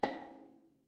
instrument wood block

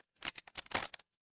impact crate break

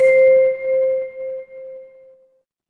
instrument chime bar

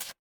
retro game hit

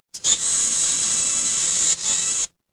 animal insect

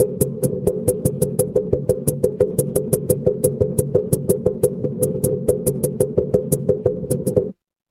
mechanical machine

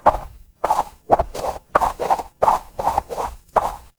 footsteps snow

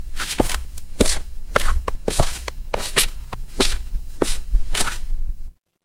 footsteps concrete

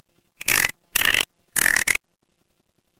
animal frog

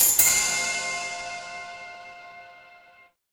instrument chime bar

